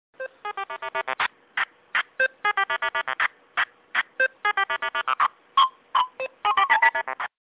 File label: iphone synth